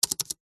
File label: technology computer keyboard spacebar presses fast; zapsplat